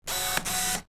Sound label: camera focus